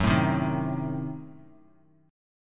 F sharp Diminished 7th F sharp Bass Standard; strummed; JGuitar

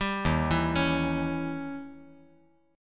D sharp 7th Flat 9th; arpeggiated; JGuitar

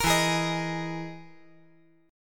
E Major 7th Flat 5th

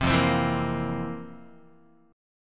F Major 7th Suspended 4th, Guitar; strummed

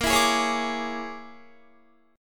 Bb 7th Sharp 9th Flat 5th Bb, Guitar; Bb7#9b5; strummed; JGuitar

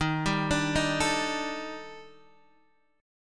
Eb Major Sharp 11th, Guitar Standard; arpeggiated; chord

